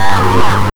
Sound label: FX, Big Beat Drumloops